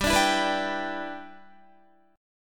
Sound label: G 6th, Guitar; G6 strummed; 15,17,14,16,14,14